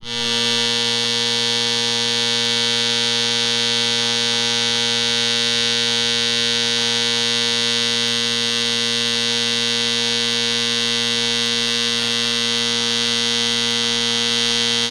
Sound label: Alarm Clock Buzzer; Home & Office; 1001 Sound Effects